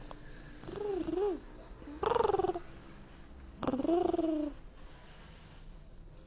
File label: attentive; pollen robotics; reachy mini emotions library